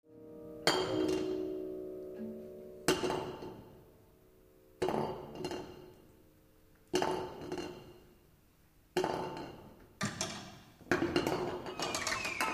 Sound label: Noise